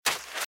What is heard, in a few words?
Footsteps Sand Dry Run Left; RadwinIsland